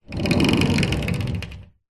household door wooden rolling screen roll; zapsplat

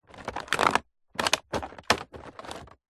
leisure board game box rummage remove game parts; telstrations

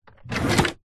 drawer wooden open

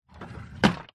household drawer wooden close; zapsplat

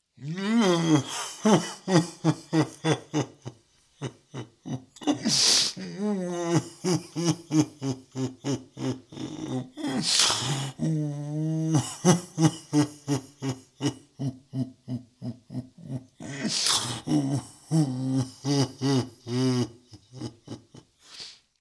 fantasy giant male crying in home; zapsplat

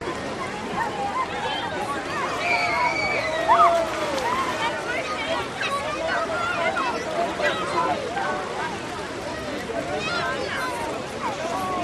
busy beach ambience loop; the sound pack tree

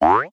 cartoon spring boing

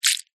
animals insects worm drop

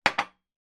household coffee mug down on glass table

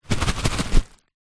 nuts in plastic bag shake; zapsplat

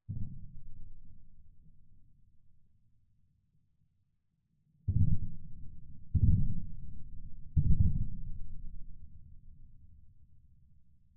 distant battle gunfire explosions booming

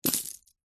household jewelry necklaces drop carpet

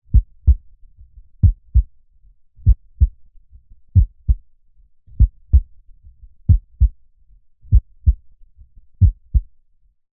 human heart beat through chest steady rhythm; zapsplat

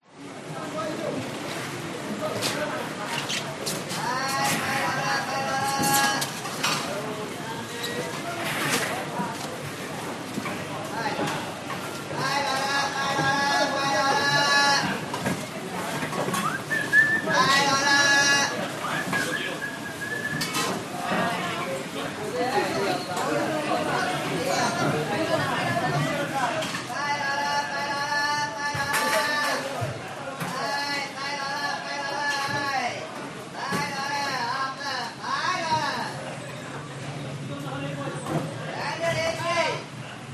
wet market singapore busy people shouting

